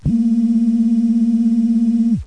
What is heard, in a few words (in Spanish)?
PORTA NORMAL